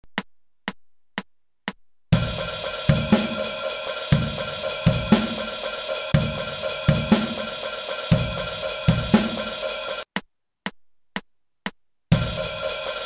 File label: 8 notes halftime